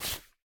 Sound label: Cloth Take; Game rip; MiSide